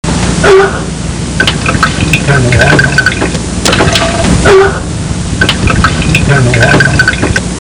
turn the water off

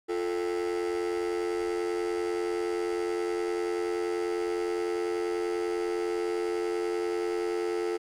dial tone

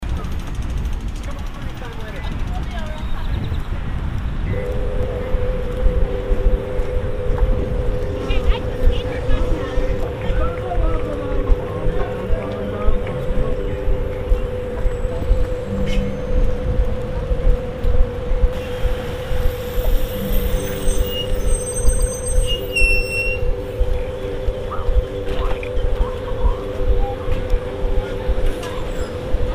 street sketch

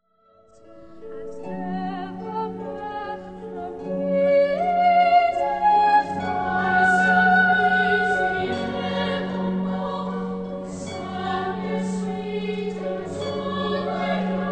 Choirboys

